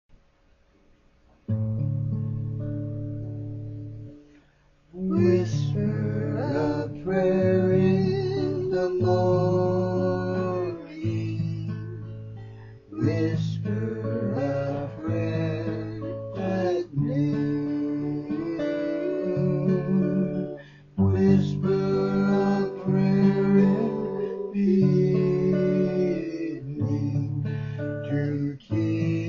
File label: WHISPER A PRAYER